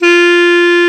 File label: KLARINETT, Alpine Volksmusik; Best Service